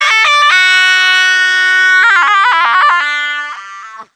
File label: scream; glados